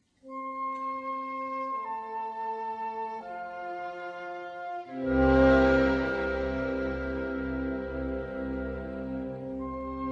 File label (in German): Klavierkonzert C-Dur Andante